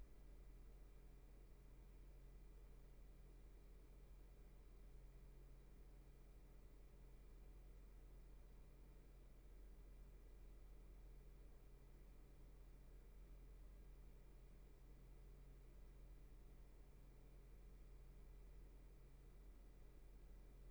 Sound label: room tone